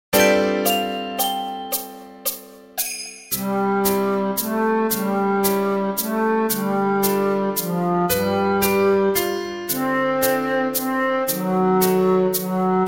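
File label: Spirit Of Christmas accompaniment bass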